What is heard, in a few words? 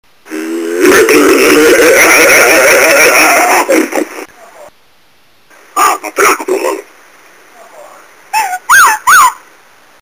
growl; sierra